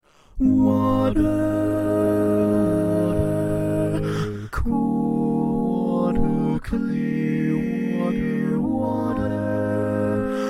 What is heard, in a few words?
Lead, barbershop tags